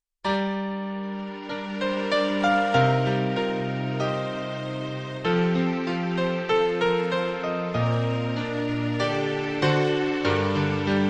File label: love ballad intro